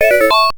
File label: Coin